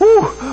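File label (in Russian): отдышка веселая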